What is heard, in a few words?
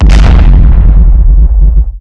impact